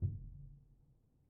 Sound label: Player Ped Off, Upright Piano, Pedal; Chordophones; Upright Piano, Knight